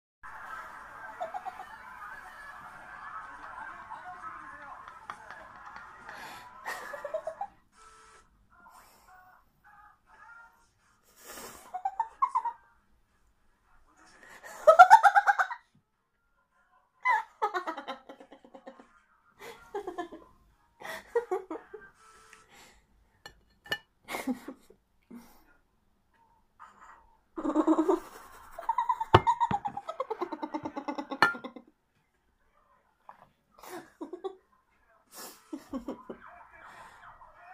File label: Laughing; Laughing at Taemin